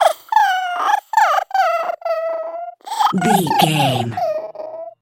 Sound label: goblin fairy cry